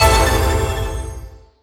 menu hit; Crimson Stella

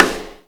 soft hit normal; 「sigma」- Azure Snowfall lite

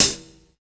drum hitfinish; megarekt

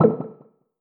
pause continue click; Crimson Stella

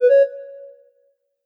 menu back hover; 『DTMoon』

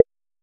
click short; 『DTMoon』

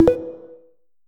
match join; Tippy

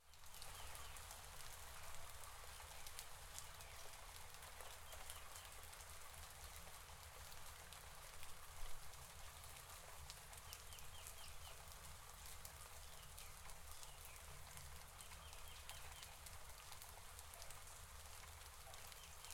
pause loop; Luxariah Default Minimal

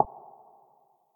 pause hover

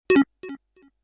section fail; 【Mrekk 3.0】 - Abyssal

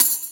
drum slider tick; MonkoPastel

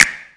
soft hit normal; Calliope M - Dots

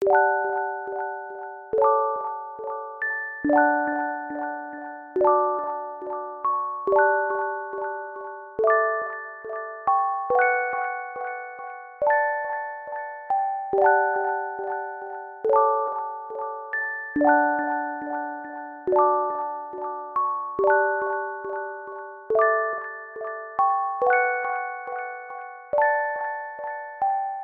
pause loop; F u w a

